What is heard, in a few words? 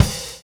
Cell Kick; Sonic Foundry (Sony Creative Software); Drums & Drones; Construction Kits